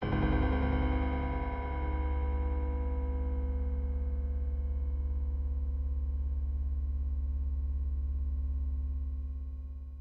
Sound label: spooky